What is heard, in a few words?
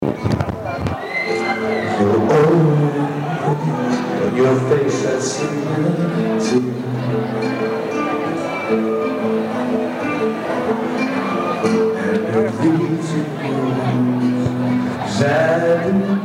acoustic